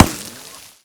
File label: dirt, bullet collide; STALKER; IWP